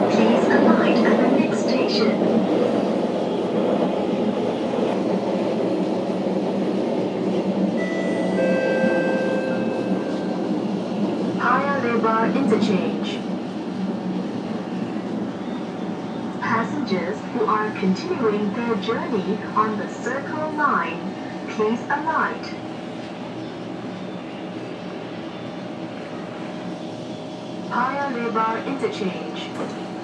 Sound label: sounds of the mrt singapore